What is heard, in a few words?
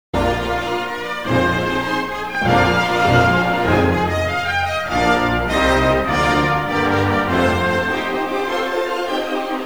Loop